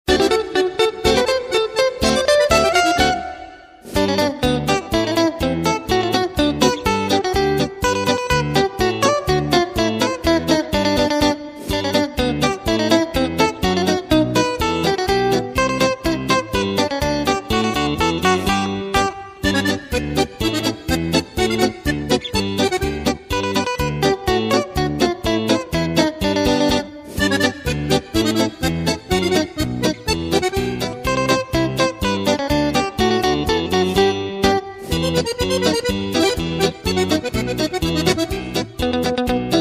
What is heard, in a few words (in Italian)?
pizzicata